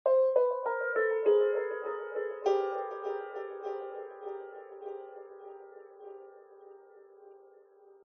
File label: game lose